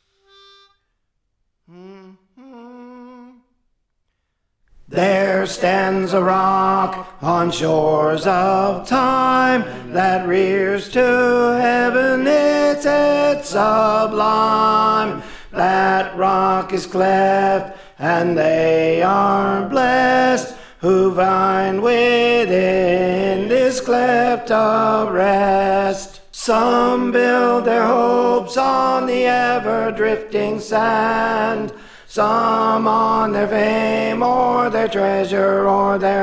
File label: Tenor; There Stands a Rock; Praise For The Lord Training